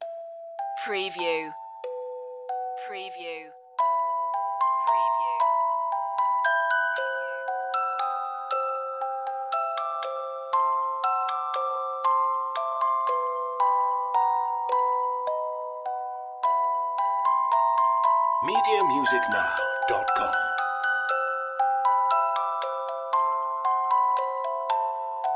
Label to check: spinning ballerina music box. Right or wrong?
Right